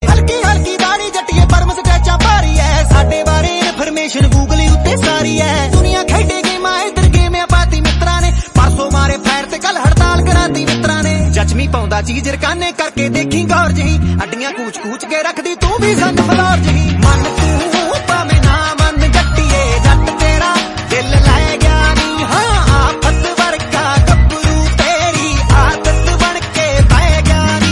Punjabi Song

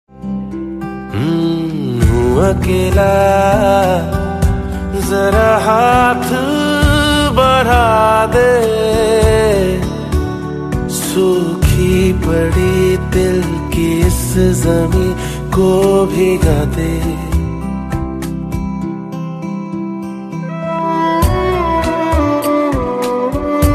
Sad Ringtone

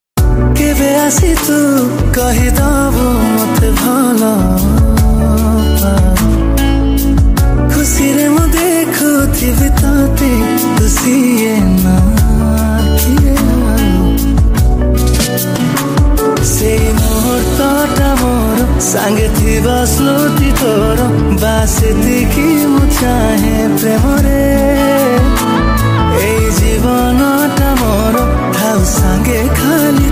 Odia Love Ringtone

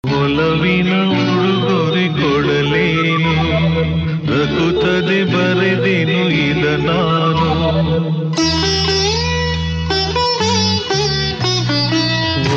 kannada Movie Song Ringtone

